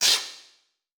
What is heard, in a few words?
Multimedia Internet CD-Rom Flash Short